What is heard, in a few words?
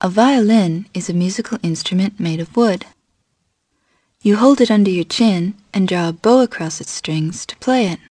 viol